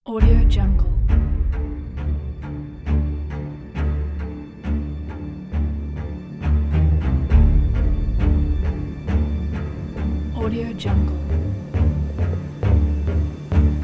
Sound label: cinematic epic action adventure trailer